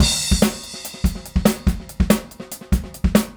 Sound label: Straight Kit, World Pop Beats